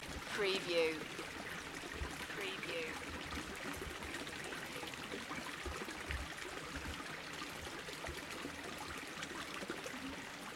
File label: Flood water running down a mountain path; Moel Famau